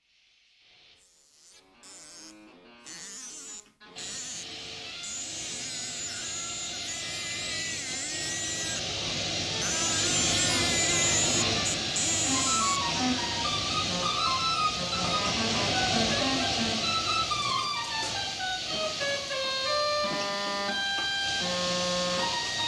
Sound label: Trio